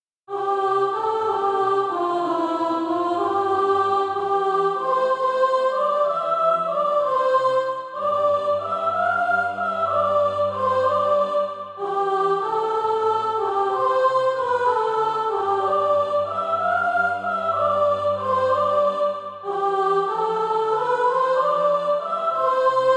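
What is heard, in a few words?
soprano, Practise Music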